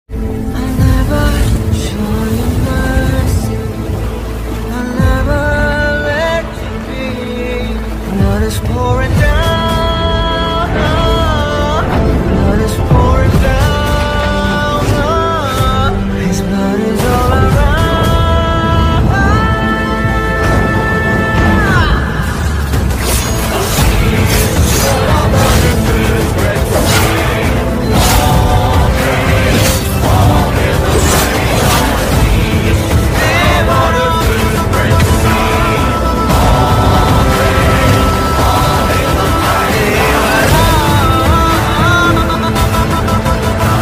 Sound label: Bgm Ringtone; NTR; Devara Part 1